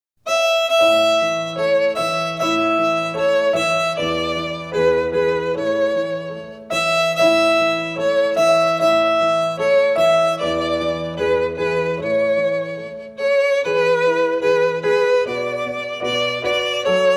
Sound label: Suzuki Violin Method, Folk Song; O Come Little Children